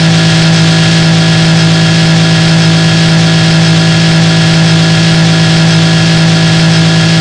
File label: engine